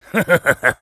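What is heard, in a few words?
laugh evil, French; Engineer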